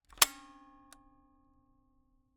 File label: Camera, Kodak EK2 Instant, polaroid, large, plastic, springs, snap; Eiravaein Works; Vaeyan IV